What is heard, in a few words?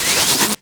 Powerplant Large Electricity Hit; Corpus Railjack; Tether Power Plant Exterior